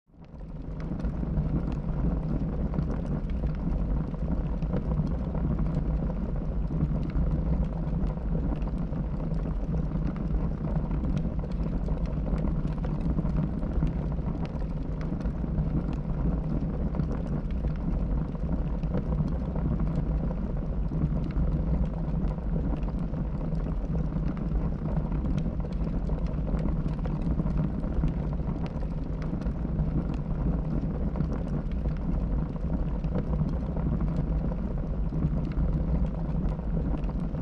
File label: Torch Constant Burni, torches; Torch, Constant Burning